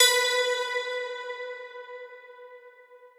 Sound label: Crystal B5, osu